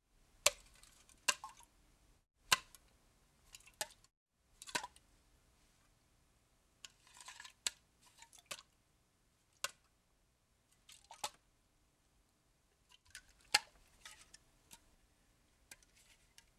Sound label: Can Soda Pop Touches